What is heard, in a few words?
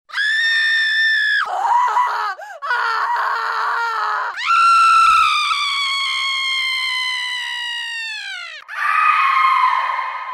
scream horrible ringtone